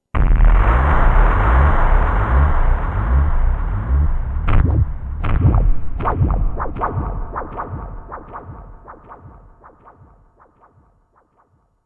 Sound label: sfx